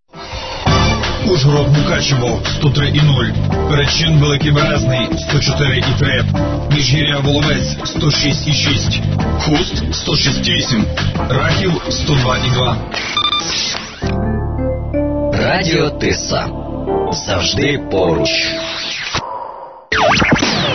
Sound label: interval signal; ur transcarpathia tysafm